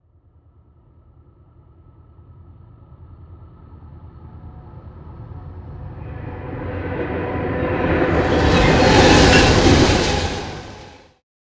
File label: train tunnel